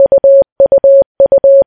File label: notice; builtin